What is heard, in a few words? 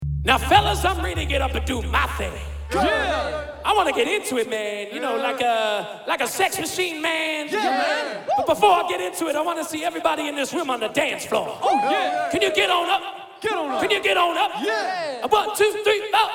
Soul Band; Surrey